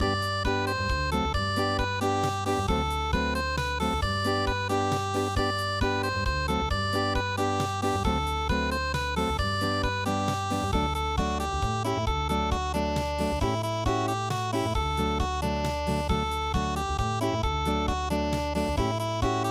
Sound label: unpluged